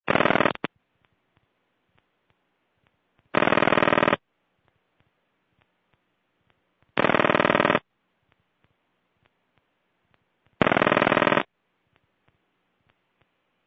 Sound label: 755A Ringback; Sounds from a Western Electric 755A step by step PBX